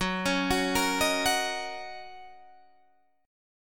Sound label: Fsharp 6th down Guitar Open D